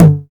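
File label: TR-909 Tom Mid, Roland BK-5 Toms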